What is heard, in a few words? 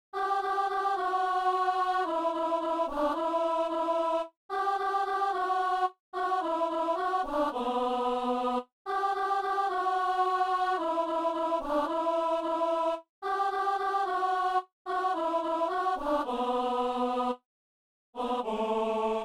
alto